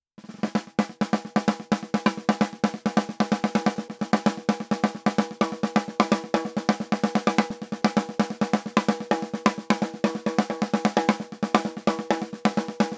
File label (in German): 1073 Preamp Vergleich Heritage HA73 SD 35 dB